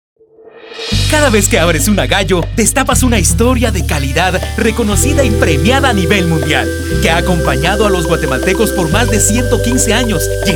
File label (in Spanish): Gallo, jingles